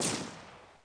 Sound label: Missile Mini; Harvest Massive Encounter